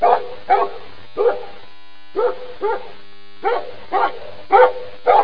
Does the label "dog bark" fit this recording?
Yes